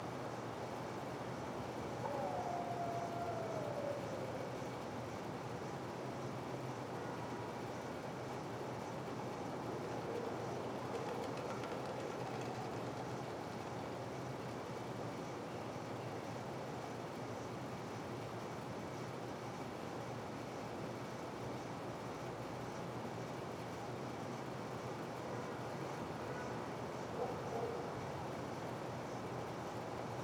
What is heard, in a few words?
Alley Soundscape